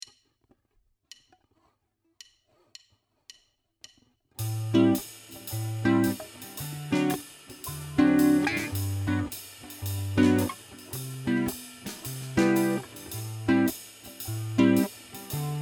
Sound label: First 4 bars 1 bar per chord